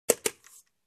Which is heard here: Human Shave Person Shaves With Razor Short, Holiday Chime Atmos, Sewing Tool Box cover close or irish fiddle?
Sewing Tool Box cover close